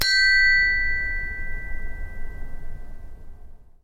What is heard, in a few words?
chinese bronze bell front; sackler